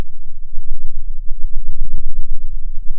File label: pop, Sound of a thunderstorm